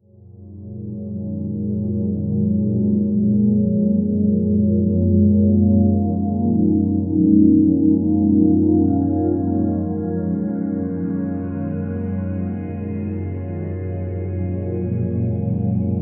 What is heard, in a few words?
deep ambient melody, reversed piano; deep ambient melody with